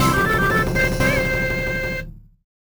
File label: Success; Assembly Dojo